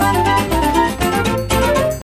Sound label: mus cage forcefield; jungle world